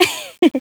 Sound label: laughter; svencoop; player sounds; lappland